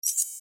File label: subtle tech interface